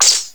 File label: Laser Gun; XGSfx